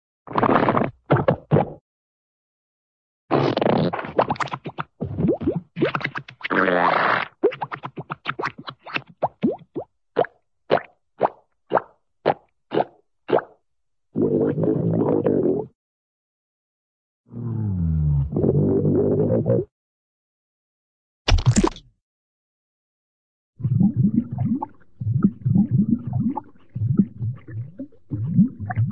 bubble, cartoon; skip's flartles

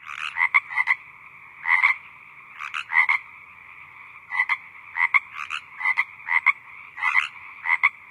animal call frog loop; Source Engine; tf; lair

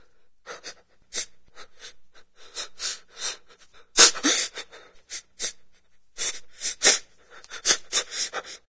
crying loop, voices; Source Engine; video game

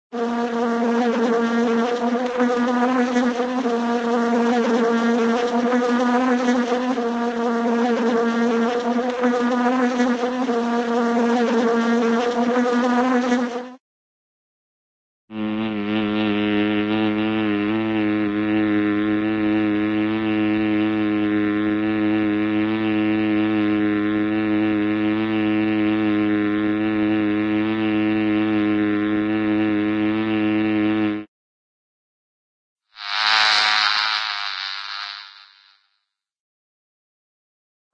bees single bee buzzing, animal, insect; cartoon

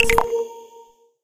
button synth positive; video games; source engine; portal2

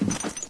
wood, player; Source Engine; dod

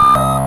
combat scan, scanner; Source Engine; video game; npc

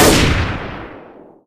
hunting rifle fire; Left 4 Dead; Source Engine; gunfire

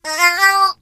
turret squashed

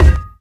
physics cube

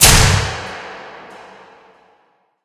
arena lights on; ambient; Left 4 Dead 2; Source Engine; atmosphere

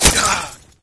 fall pain, player; Source Engine; dod

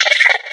box monster chitter

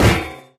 physics turret impact lg

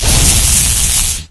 core player hit, citadel; ambient; source engine; episodic

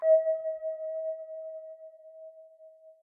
ping available; ui

